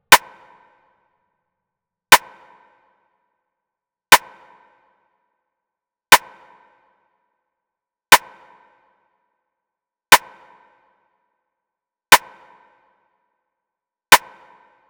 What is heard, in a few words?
Drum Loop, 120 BPM Rim; Loop Stems; Cymatics; Vibes II Drum Loop Collection; Operation